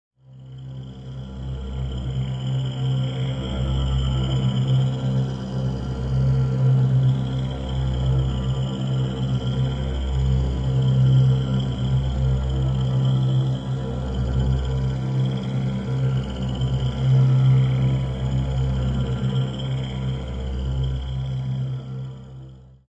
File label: newage cricket time; Pads